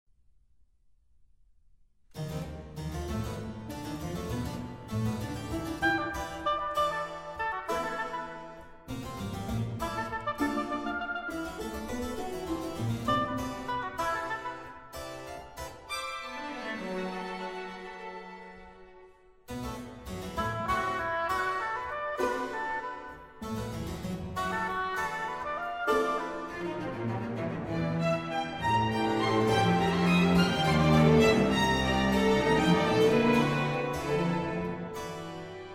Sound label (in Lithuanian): Koncertas obojui, klavesinui ir styginiams; lietuviu muzika